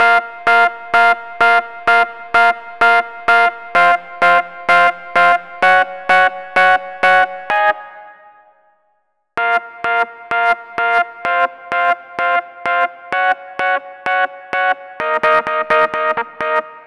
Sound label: Rhodes, 128 BPM; Vengeance Samples; Vocal-Hook Kits; Song Kit; Keep Believing